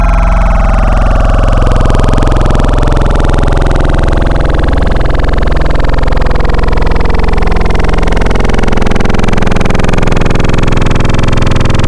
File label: Evolution Of Triangle, Sub 37; Synthetic's Serum Collection; EvoSounds